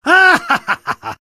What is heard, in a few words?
spy laugh happy